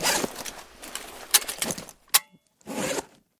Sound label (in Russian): rasstegivanie i zastegivanie molnii